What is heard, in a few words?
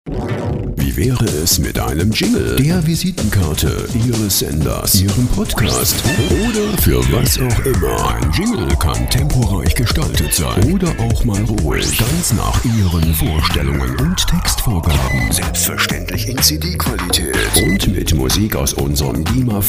jingle